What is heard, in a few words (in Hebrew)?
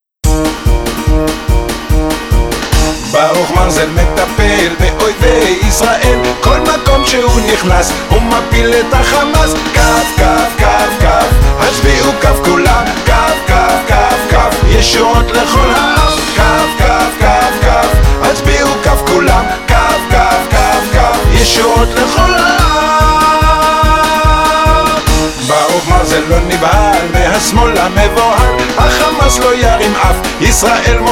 גינגל